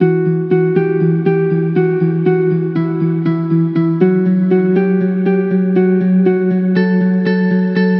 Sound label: Musical Loop 120 E Minor; Conclude; Ghosthack